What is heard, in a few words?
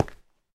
stone, step; minecraft pe 0.6.1